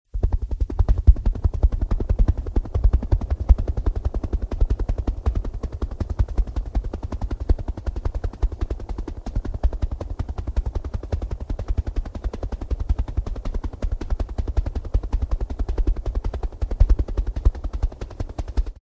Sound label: helicopter; free rider hd